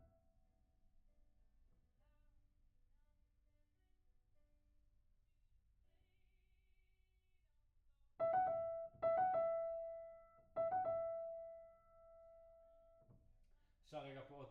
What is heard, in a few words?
Piano C